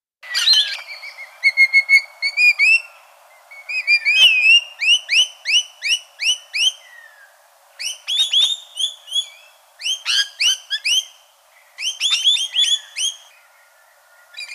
rainbow Lorikeet, parrots

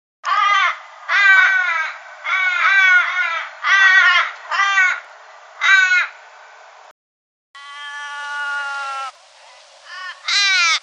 australian Raven; ravens; canberra garden birds

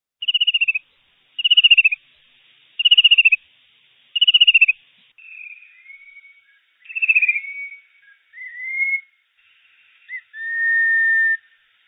fantailed Cuckoo; canberra garden birds

